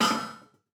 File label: metal hit; teardown ps5 windows xbox series xs gamerip 2022